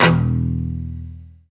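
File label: guitar chunk mute; Club-50 - Foundations Roland; Funk & Mute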